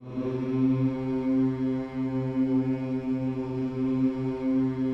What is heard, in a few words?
HMMS C3, gospel; Spectrasonics Vocal Planet